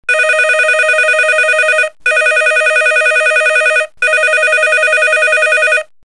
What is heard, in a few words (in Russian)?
domashnij telefon